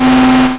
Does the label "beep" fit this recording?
Yes